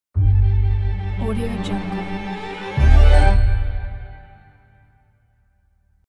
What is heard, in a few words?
cinematic logo; jorgepires